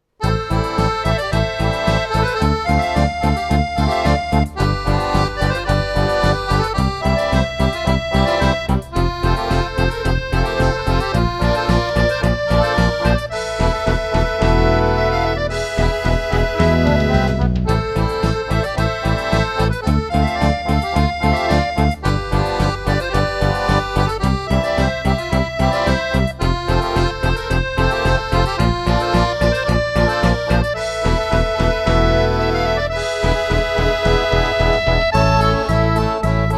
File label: Rock Café